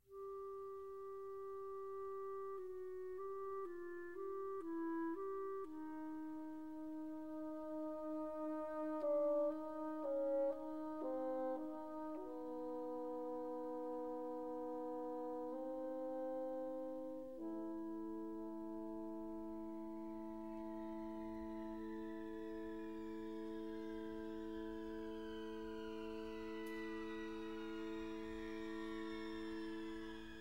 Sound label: dream turns bad at 30s